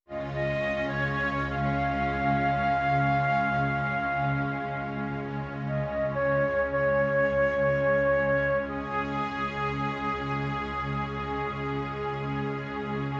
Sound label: Musical Soul Portrait